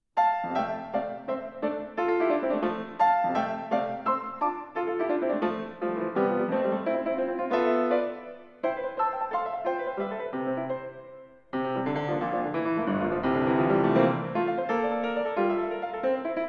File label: orgue, Cromorne; Pi ces en G, R Sol-Mineur, Premier livre de pi ces d orgue III. Cromorne en taille